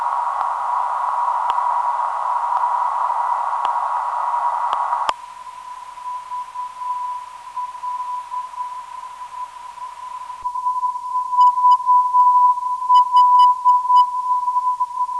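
VL 320kHz 400 24 8Hz filter, non-directional beacon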